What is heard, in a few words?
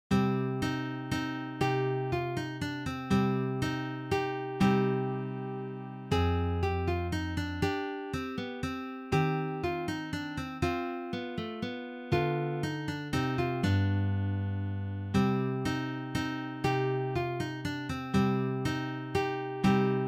Trio